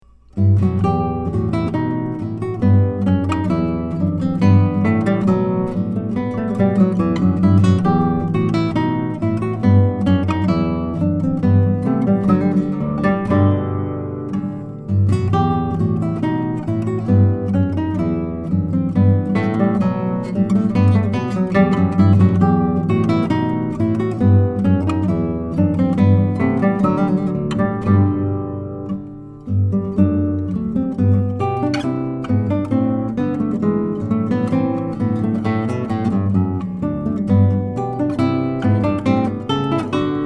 Bouree; Neumann KM84

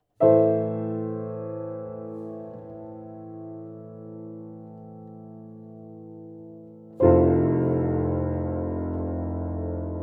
Piano; AMI KM100f

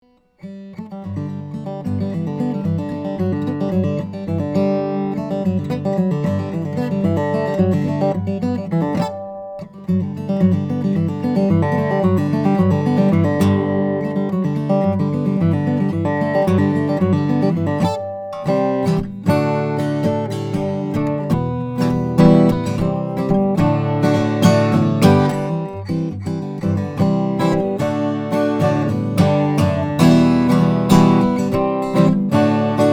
Country

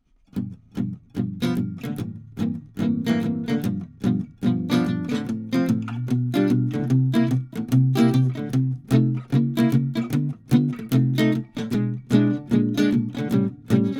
Jazz, Gibson L7; L7 ARCHTOP

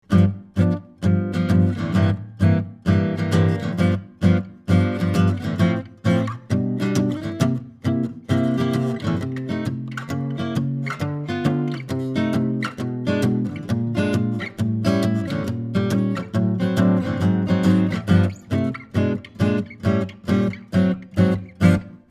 Jazz

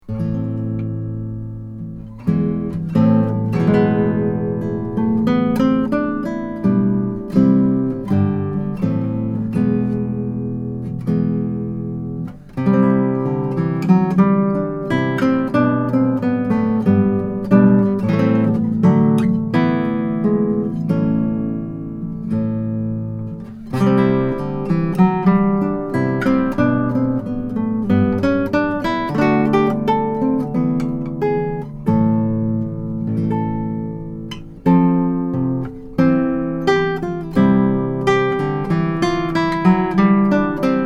Pavan; Nunez; 1933 MARTIN O-17 GUITAR

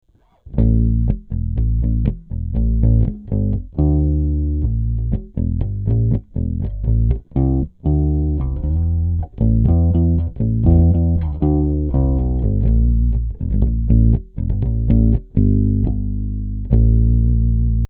Rivoli improv; recorded direct through a TAB-Funkenwerk V71 Tube DI; no EQ, compression, or effects; Epi Rivoli

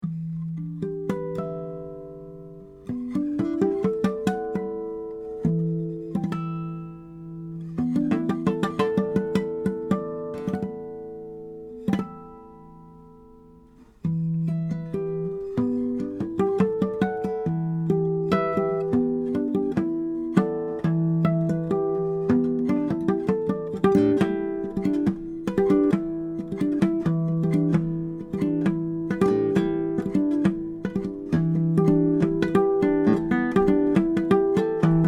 Harmonics; Bartolex

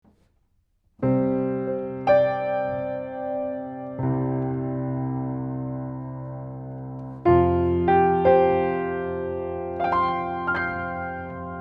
Piano, UM17; SHAFER GRAND PIANO; AMI UM17

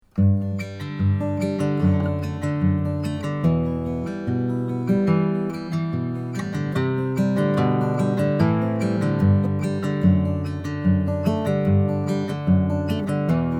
Fingerpicking

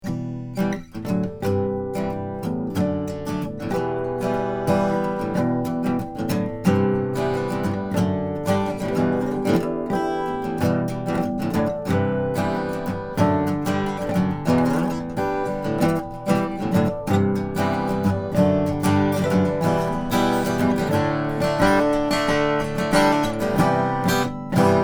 KM183 Harmonics Duo

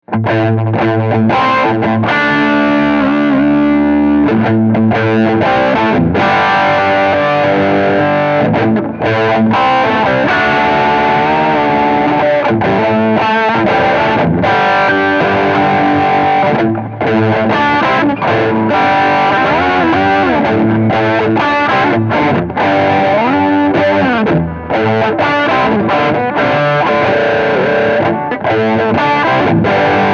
Funk

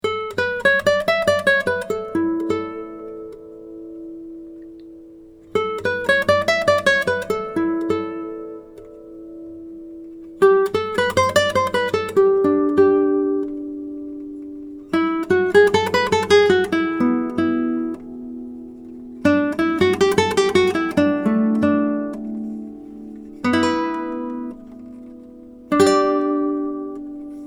Resonance

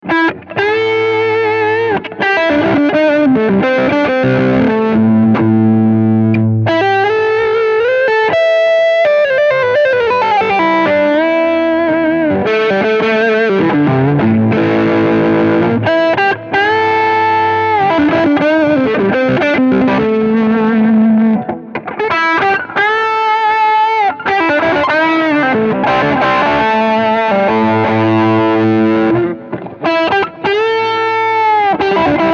Pick Up Selector

